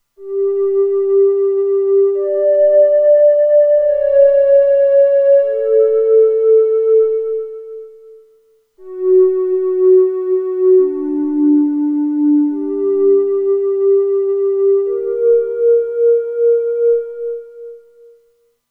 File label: Sinusoidal, Roland Alpha Juno-2; Original Sound Chart patches